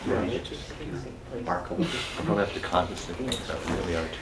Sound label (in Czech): ambi sepot; Mafie 1